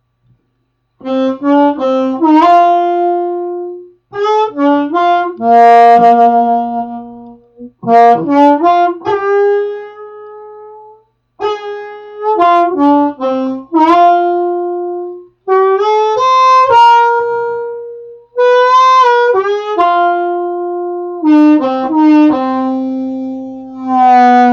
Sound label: Slow blues electric, harmonica; DIY bullet style harmonica mic